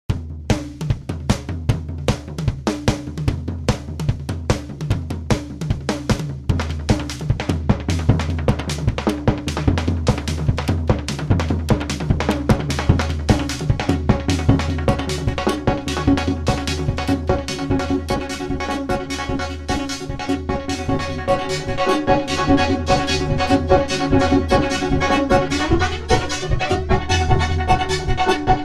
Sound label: Drum loop